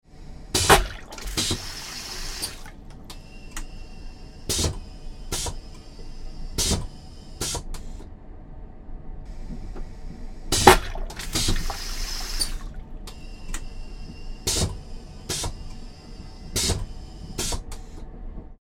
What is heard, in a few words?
Toilet Flush in Aircraft or Train; Air hiss and suction sounds various; Tom Hutchings